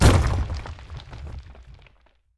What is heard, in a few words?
breakable wall death; Hollow Knight